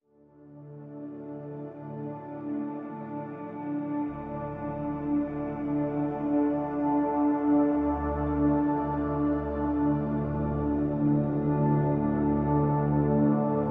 Pads Only

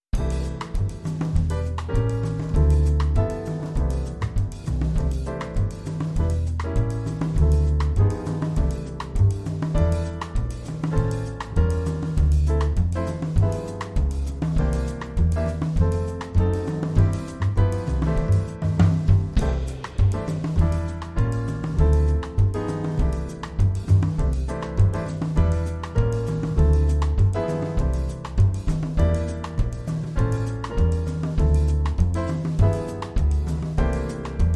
Piano, Underscore

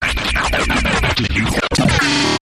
Bouncing Jumble, Taylor Grand

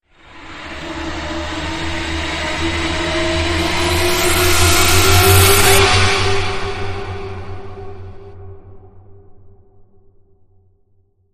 Sprint Surge Rise

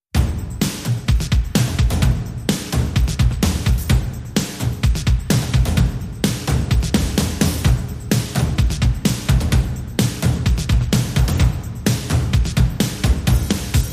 Drums Only Mix

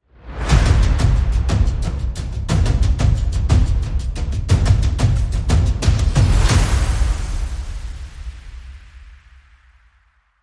Drum Outro

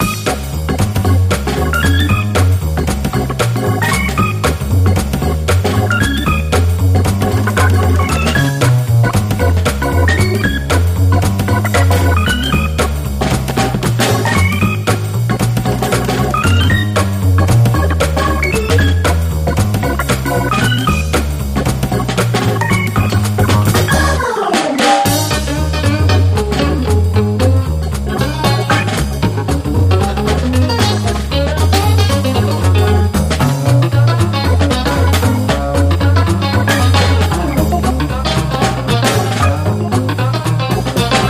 No Vocals

